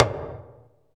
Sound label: PER, Percussion